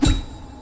hit spikes; hollow knight

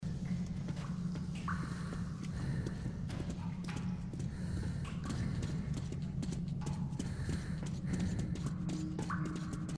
metrotunnel sound; metro 2033

